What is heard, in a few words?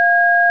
dtmf a